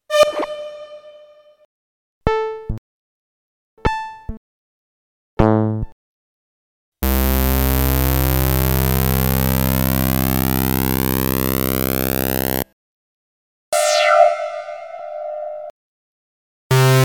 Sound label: jupit8 fx